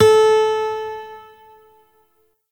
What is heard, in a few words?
Shawzin Oct Two A; Warframe; Lotus Shawzin